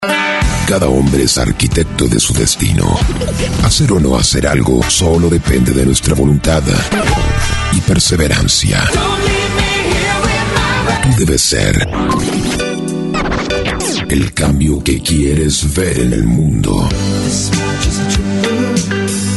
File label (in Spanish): arte radio retro